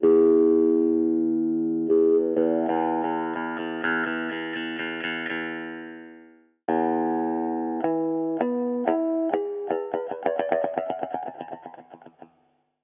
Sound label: Overtones